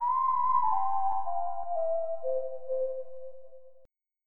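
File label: whistle; Roland - An Invitation To The Roland World Of Music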